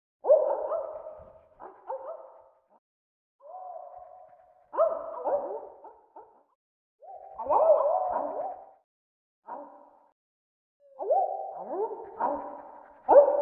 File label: Flea Festival crowd bg loop; Hollow Knight: Silksong